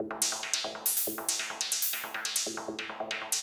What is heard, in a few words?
PERC LOOP INDST 140, Industrial